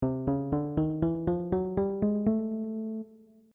C Major Scale

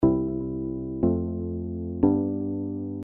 C Major F Major and G Major

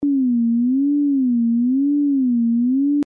Sine Wave with 1 Hz LFO; low frequency oscillator

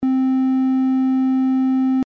Triangle Wave, analog synthesizers; Low Frequency Oscillators (LFOs)

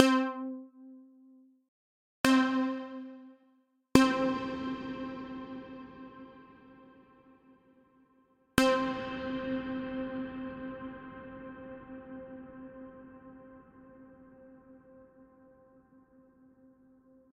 Reverberation effect; reverb music production

